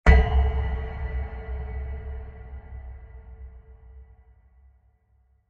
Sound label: Deep Dark Metal Impact; Blast Sound Effects; Blasts (Sound Effects:Impacts)